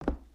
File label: Foot Wood Boot Walk; footsteps